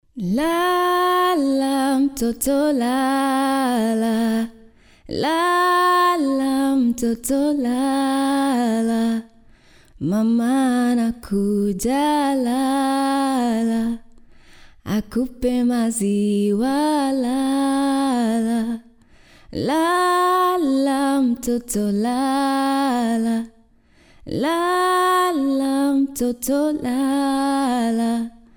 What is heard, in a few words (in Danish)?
verdens sange Afrikansk sang